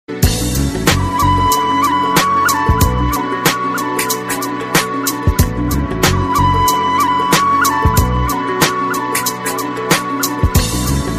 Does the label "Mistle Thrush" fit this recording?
No